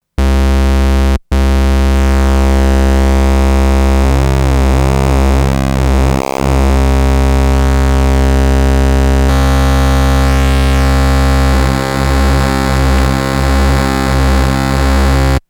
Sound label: test width range; Solton SM100